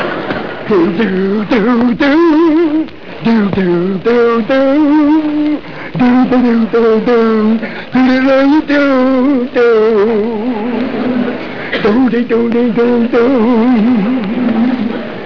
ALOHA; Pixie Dust

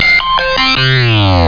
machine; roadwar

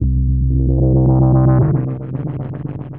SNTHBASS D+B 160 C, Drum Bass, Bass

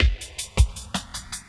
DRUMLOOP D+B 160, Drum+Bass Loop; eJay

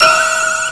NOISES

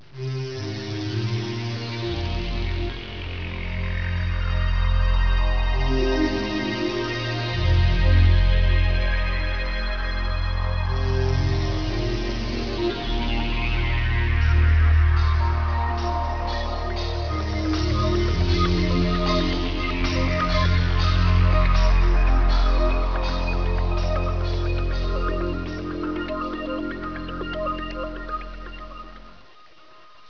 PAD

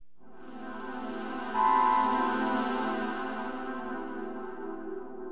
dawn, keyboards; HipHop; LiveAct Pool 1

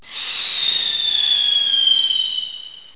WHOOSH; ZOOMBINI; GERMAN

